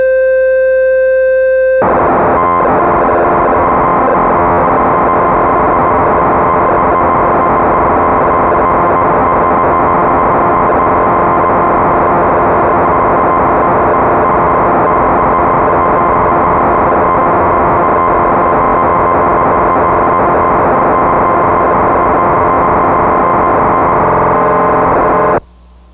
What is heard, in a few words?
Crumar Bit One